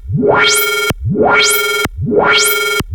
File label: SF ALARM, SCI FI ALARMS; Zero-G; Ambient 1